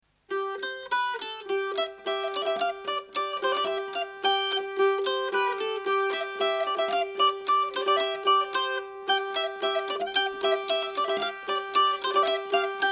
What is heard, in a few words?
polka; Polka du Lac